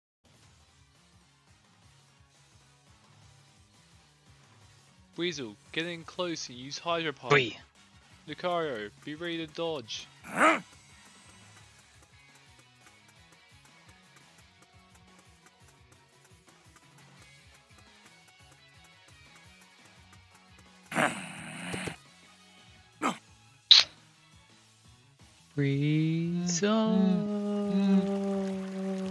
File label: pokemon battle; swell; Jamestom200